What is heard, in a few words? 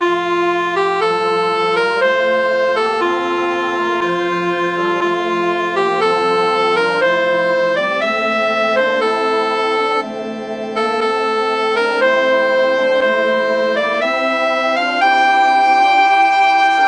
rejoice soprano